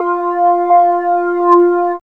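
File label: synth tone; E-Lab; X Static Goldmine 1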